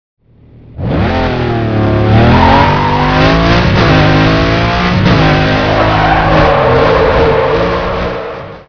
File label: race